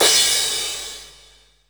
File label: Cymbals crash; Vengeance Samples